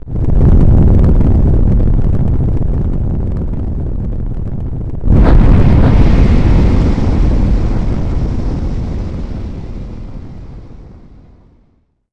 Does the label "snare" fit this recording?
No